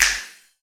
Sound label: verby clap single shot A key